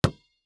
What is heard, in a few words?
Sports Basketball Bouncing Cement Floor